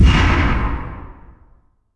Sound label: strength fire, weapons